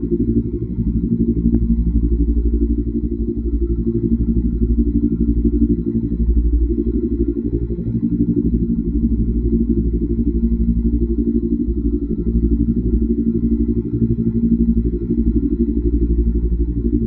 avian colossus idle layer; apocalypse

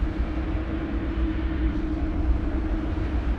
distance hive minion idle; Stellaris; guardians

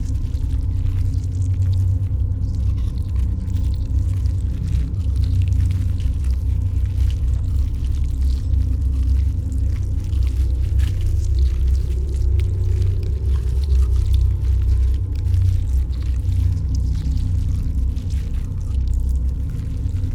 ant ship mining station idle; biogenesis

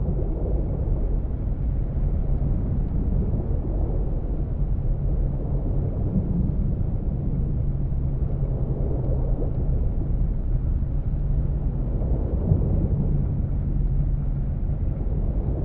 cruiser idle; aquatics

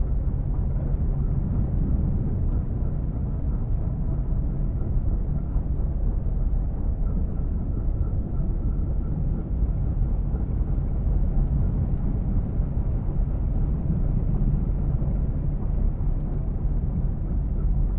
ship battleship idle; aquatics

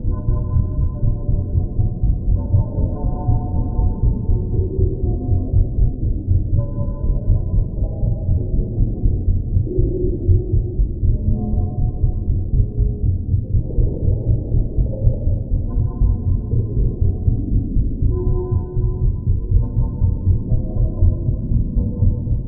matter decompressor hum; Stellaris; megacorp

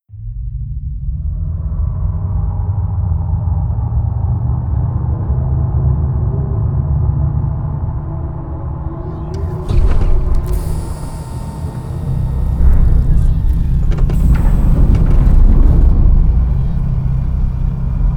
plantoid colossus opening; apocalypse

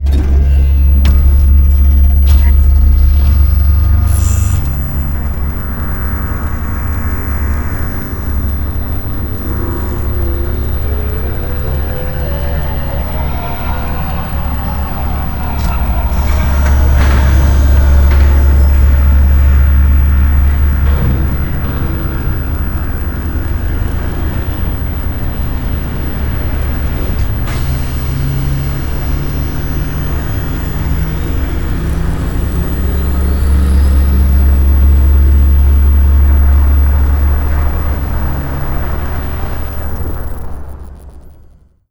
sfx colossus opening; Stellaris; nemesis

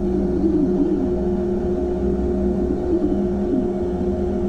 ambient space pirate small ship; Stellaris

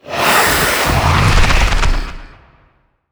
archeology event dangerous animals; ancient relics

